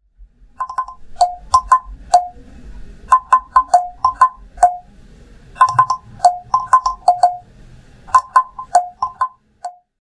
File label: Log Drum Chene Ipe